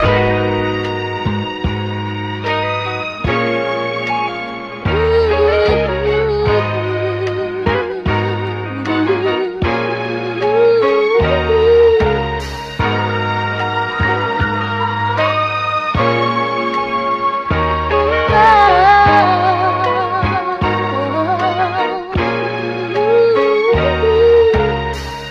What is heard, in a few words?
strings and voice; Loops; Phonky